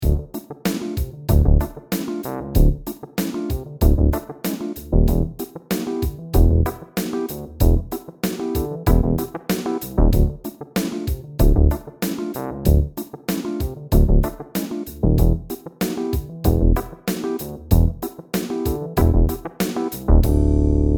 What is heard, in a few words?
Disco Sub, Funk Disco Basses; UA Theme Packs